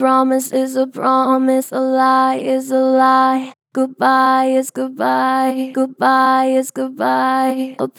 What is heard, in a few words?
Str Flat Promise is a promise, Vocals, Pitch Flat Strong